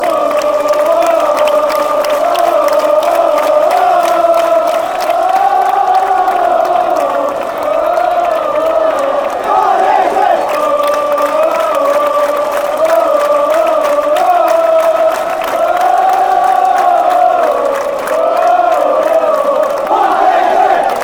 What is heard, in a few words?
oh oh, fanchants; varese